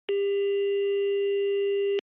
Telephone Signal Tone Dial

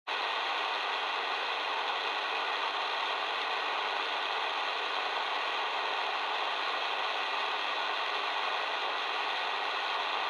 Radio Signal Noise AM

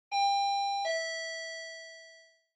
Intercom Ding Dong Far

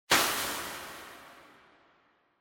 Party Popper Reverb Ribbon

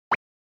SNES Action Item

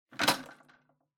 Public Phone Receiver Pick Up Strong

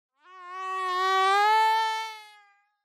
Mosquito-Real

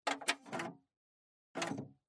Printer On

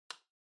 Light Switch Box Rocker Dry Gentle

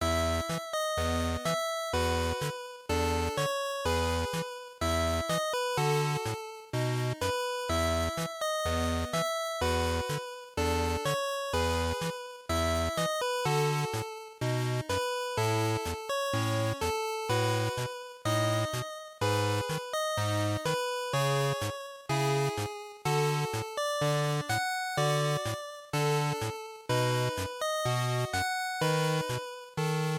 RPG Town Loop 125

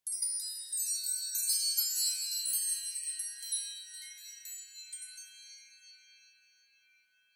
Onoma Sparkle Short; オノマトペ キラキラ11-3(短)

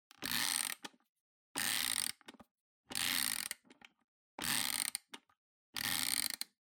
Music Box-Foley (Wind Up)

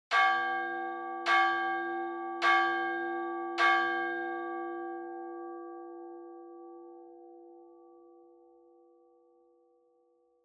Church Bell Long

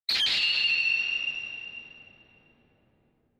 Flash High Long; Onoma; オノマトペ 光る; 高 長

